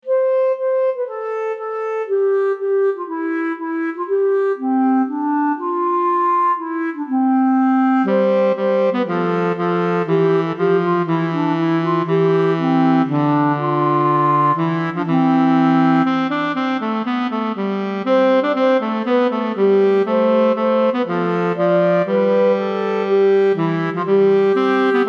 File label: Country Gardens Duet, Clarinet Saxophone; Duets Clarinet Saxophone